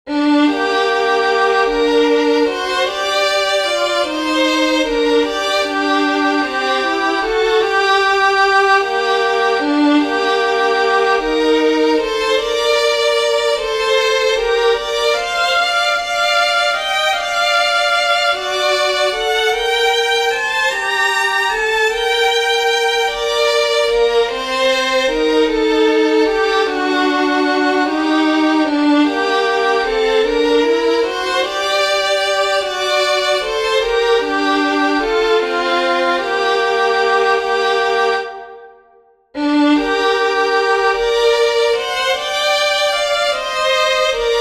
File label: LOCH CORUISK with harmony, fiddlers; southern kings fiddlers